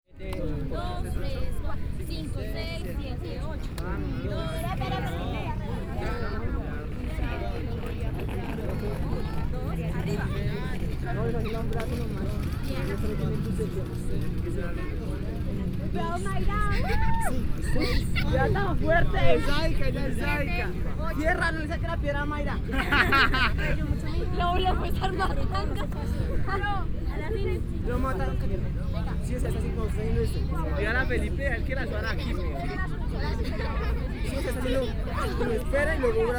acrobats et cerf volant; bogota; tintal

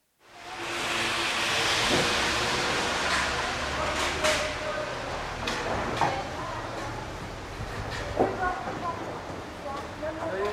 fin marche

